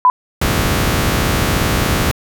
Test signal (44 kHz 24-bit) Frequency Response (multitone)